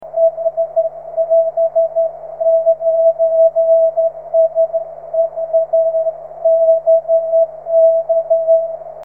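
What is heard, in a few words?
13cm continuous wave loud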